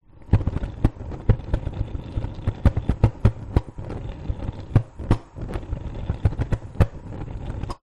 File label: household cooker gas hob flame burn going out gas low